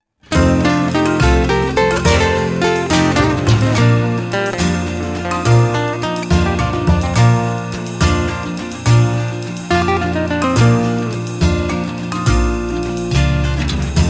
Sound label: No Backing Vocals